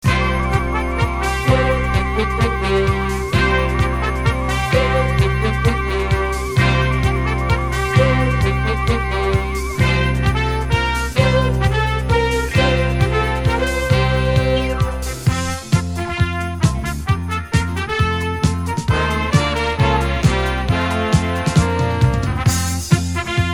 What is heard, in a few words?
Yuletide Disco